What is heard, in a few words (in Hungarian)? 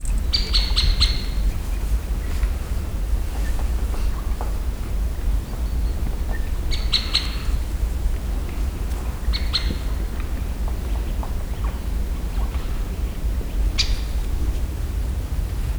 nagy fakopáncs, Visegrádi-hegység; termeszetben